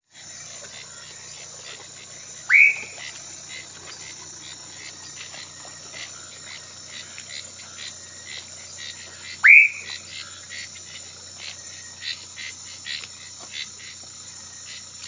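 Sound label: Leptodactylus rhodomystax, frog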